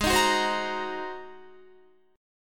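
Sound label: A flat 9th A flat Guitar, chord; strummed; 16,18,16,17,18,17; 4E,4A,5D,5G,5C,6F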